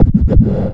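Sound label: scratch fx right; Big Beat Samples & More; AKAI; SHORTFX KIT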